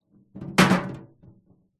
metal small container bin empty knock over or drop on concrete; zapsplat